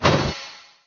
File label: Paladin deflect